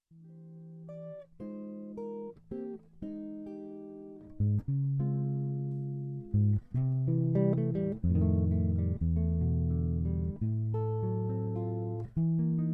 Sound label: samba